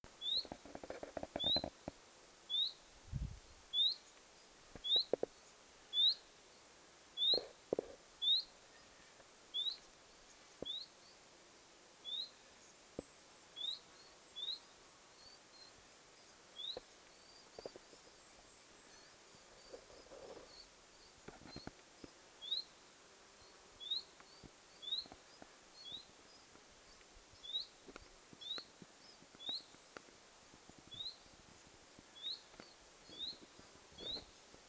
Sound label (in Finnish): tuollainen tiltaltti; tallaisia on ilm ollut lahiaikoina useampiakin